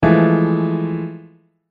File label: Chord